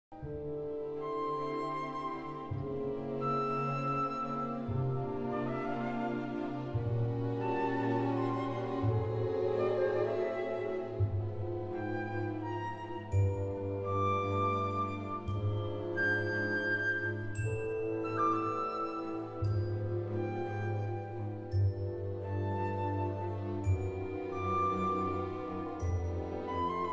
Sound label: trumpet concerto; Wessman Harri